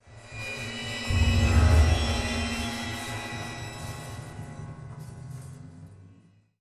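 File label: sting, strings; transformers war for cybertron gamerip; MATRIX OF LEADERSHIP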